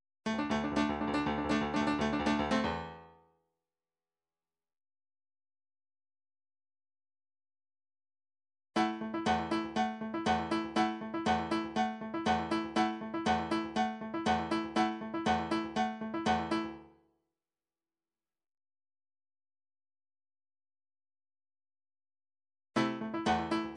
midifiles various beats 130 bpm; train

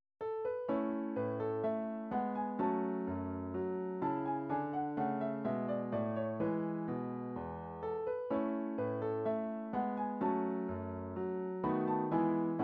classical gavotte, midi

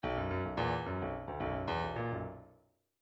bdf midi file grooves funk q funk q

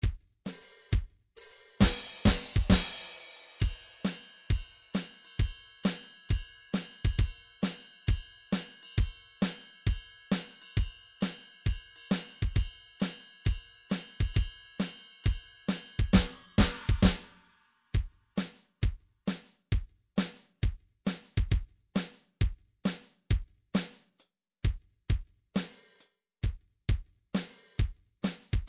midi drum patterns drums

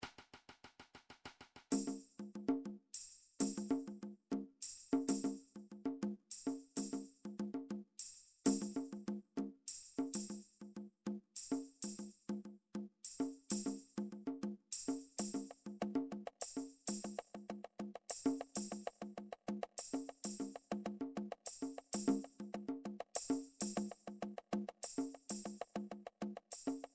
odd meters; Drumtrax Library 1

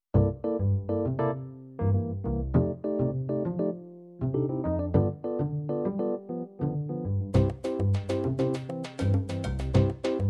cuban

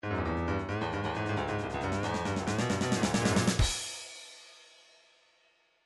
trance midi loops fills sfx; Newtronic Trance Constuction Kit

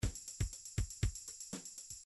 drums soul latin

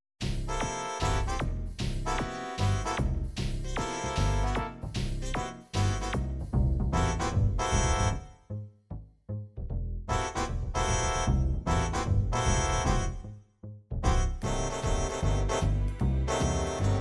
jazz big band, midi

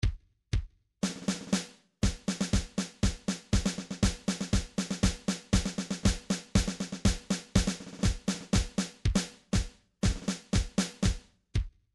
drums march; midi drum patterns vol 1 to vol 4

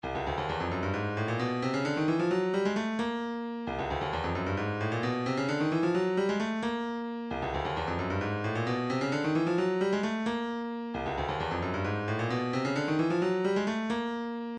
132 fx loop

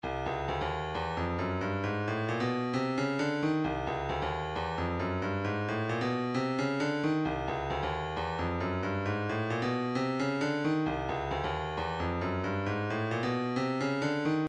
133 wavedrum, midi

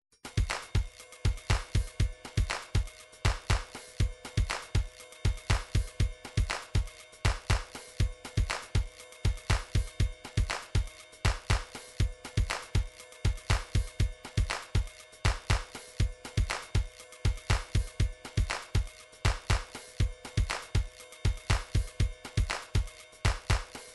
drums, midi drums

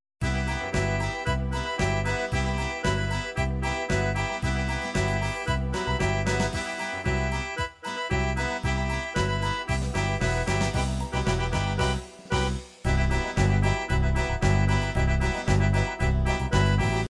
polka pop, midi

